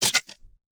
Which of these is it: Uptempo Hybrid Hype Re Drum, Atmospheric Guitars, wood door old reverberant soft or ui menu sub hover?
ui menu sub hover